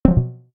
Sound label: NFC Failed; Coolpad; C558